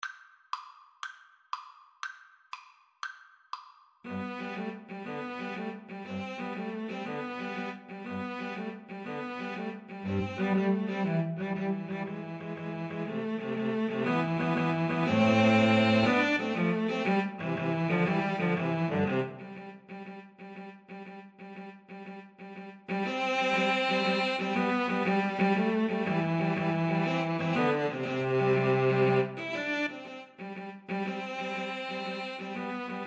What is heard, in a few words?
Cello trio